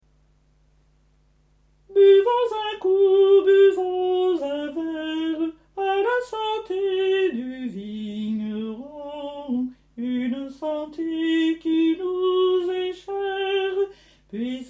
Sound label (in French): basses; a la sante du vigneron